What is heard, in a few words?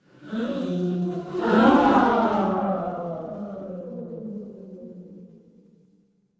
crowd gasp